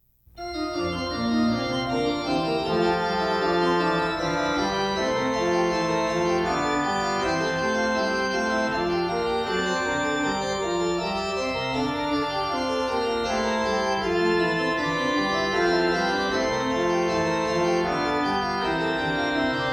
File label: Orgelbuchlein BWV 600; Bach; Gott durch deine Gute